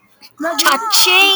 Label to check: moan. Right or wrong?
Right